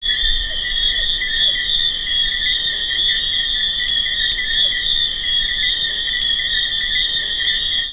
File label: bird colony; best service Blue Box